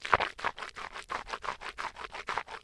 Human Male Rinses Mouth With Water

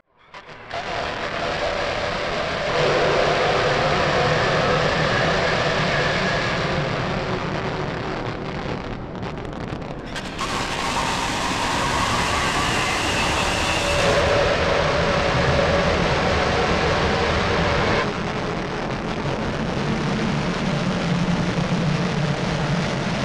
Distortion Spooky; AdobeCC; Imaging Elements; Module Production Element Title Imaging

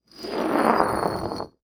Impact Concrete Dragged On Concrete

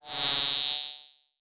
Digital; Adobe; Multimedia Internet CD-Rom Flash

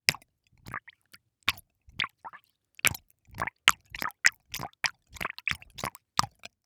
Liquid Water Water Gurgle In Rubber Container

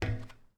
FOOTSTEP - Metal Plate Walk Barefoot Male; Barefoot on Metal; Timothy McHugh; GDC Game Audio Bundle

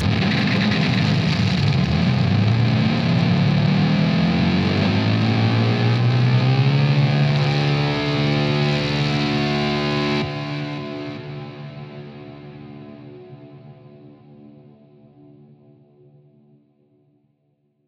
RISER, Cinematic Sound Effects

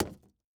Sword Stab Thin Wood, weapons; AdobeCC

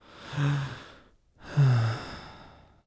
Human Male Inhales And Exhales

